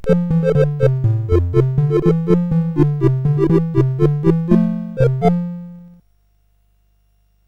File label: sid, CSG8580R5; Aquantor